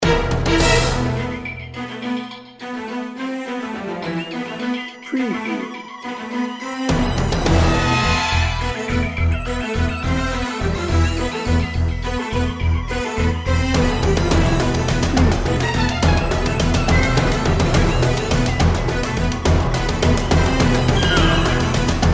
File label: battle lines